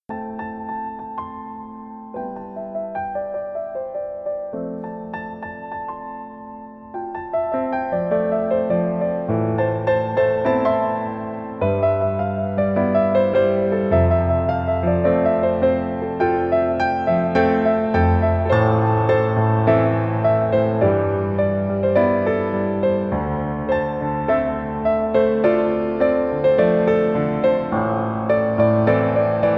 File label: piano cover